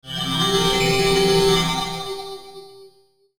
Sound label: Logo Magical Delay; Sonic Logo; Blastwave